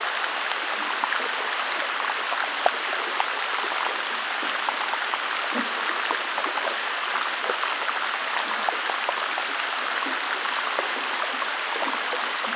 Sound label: CLose Iceberg Noise